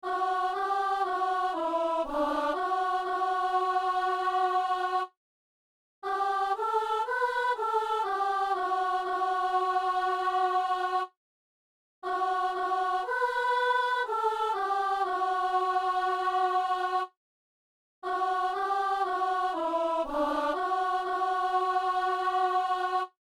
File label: soprano; Messe du 17 janvier à Saint-Denis; Mon Seigneur et mon Dieu